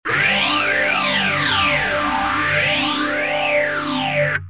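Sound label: guitar phaze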